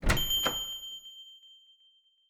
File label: channel join; voice-cat